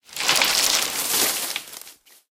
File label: tree twist; tanks; War Thunder